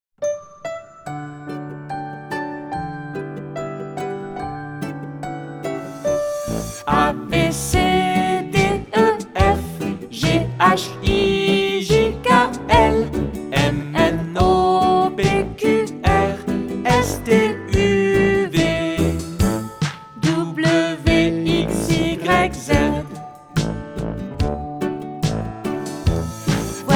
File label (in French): les lettres de l'alphabet, comptines